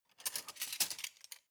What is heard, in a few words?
cutlery large multiple drawer grab soft